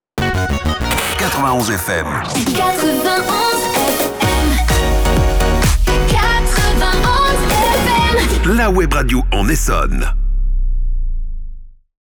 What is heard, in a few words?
Chanté F# 128 BPM RapidFire; 91FM